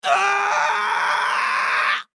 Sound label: Horror Scream Male; Screaming; Voice